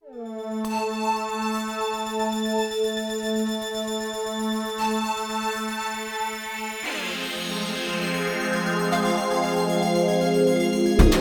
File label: ARP, synthesizers; Club Turku; Redshift 6; supercritical synthesizers